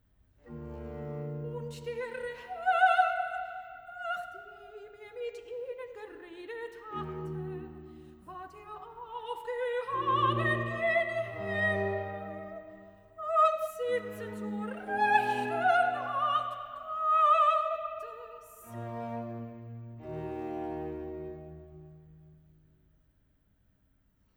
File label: Recit (S); Bach; Cantatas; BWV 43; "Und der Herr"